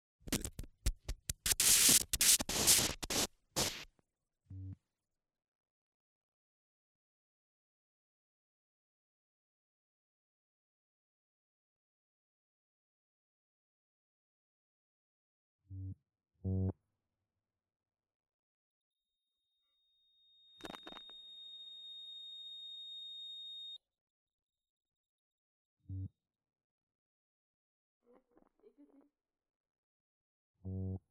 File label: noise; noisemonocle; earlabs